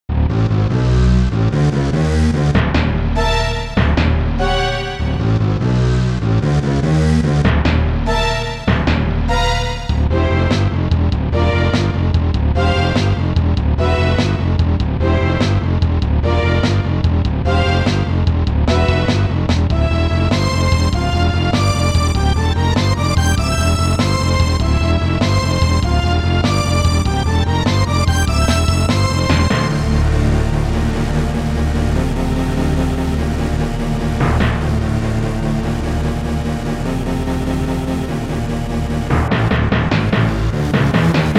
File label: Suspense; Ganbare Goemon Sound Treasure Box; Red River Suspense (Area 4-3)